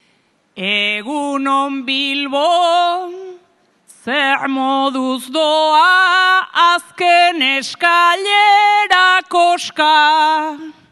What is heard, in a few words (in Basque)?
agurra, bertsoa; bilbo